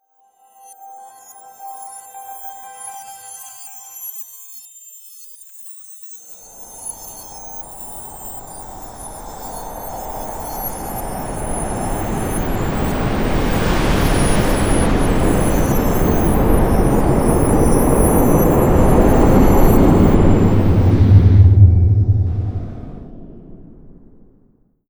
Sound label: Rain; Ruins End Symbol Rain Quad